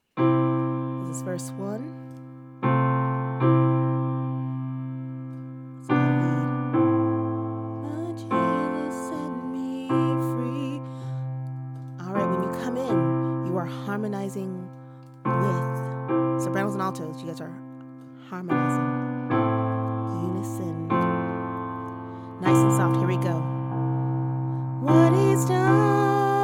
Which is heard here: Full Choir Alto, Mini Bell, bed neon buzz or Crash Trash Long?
Full Choir Alto